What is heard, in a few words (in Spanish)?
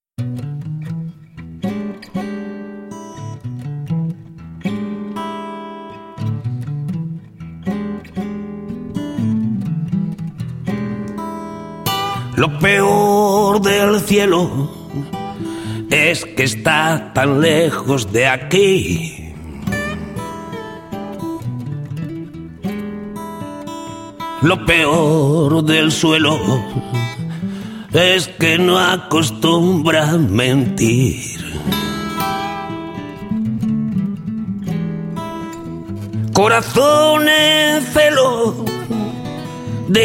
maldito blues